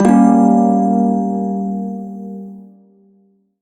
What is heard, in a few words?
Prime Shawzin Phrygian Chord, Music; Warframe